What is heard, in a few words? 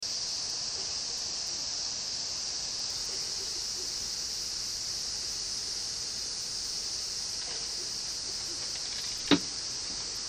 bath noise